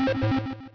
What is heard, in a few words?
beep